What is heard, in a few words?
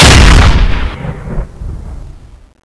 scout fire, weapons